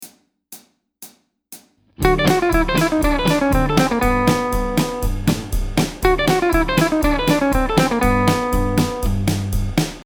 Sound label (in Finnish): hybridipikkausharjoitus, western swing; western swing -kontekstissa; Redd Hot Swingin' : analyysi Redd Volkaertin soolonsoitosta western swing -kontekstissa